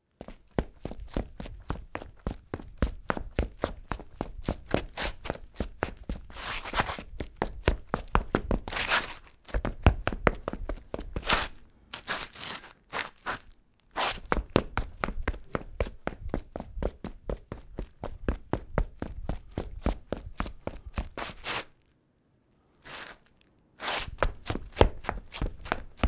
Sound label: Foot Man Run Ciment